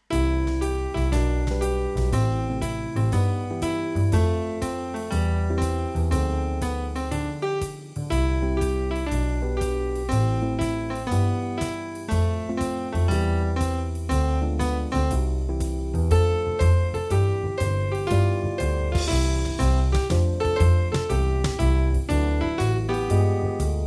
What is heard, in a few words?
Jazz